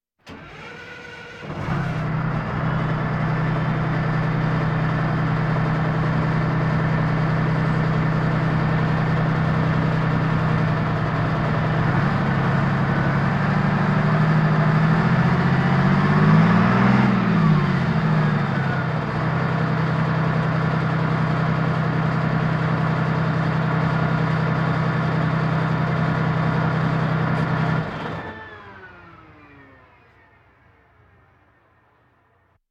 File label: Military vehicles